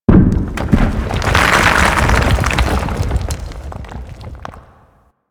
Cave Sounds Random C; Warframe; Ambience; Grineer; Gnr Cavern Corridor 1

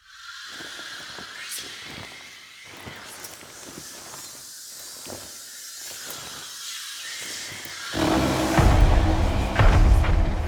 Deimos Quest Mech Destroy Heart

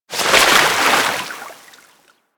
Generic Roll Water; Characters; Warframe

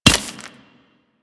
Grenade Hit Dirt; Warframe; Grineer Ghouls; Ghoul Expired; Attacks

